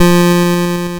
E3, LSDJ Notes; LIMAT LSDJ kit

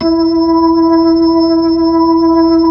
B-3 Chorus

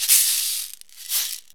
METAL SHAKER; AMG - Vince Clarke Lucky Bastard

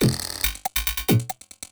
LOOP, 140 BPM, Drum & Bass

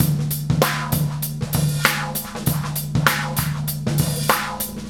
FILTER SHUFF, transwaves; Soundengine - CSR-000 The Art of Transwaves

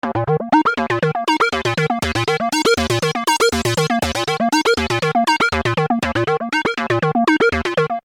Arpeggio 120 D#, Techno Synth Loops; 120bpm; Sonic Foundry (Sony Creative Software); Signals I

